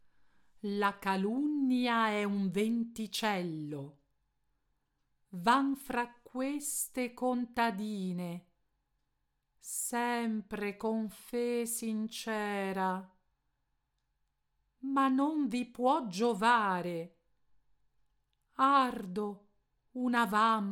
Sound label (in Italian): allofoni arie m labiodentale